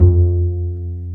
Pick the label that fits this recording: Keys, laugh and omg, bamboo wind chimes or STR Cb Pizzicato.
STR Cb Pizzicato